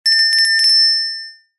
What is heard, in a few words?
bell small ring close clean shop door bell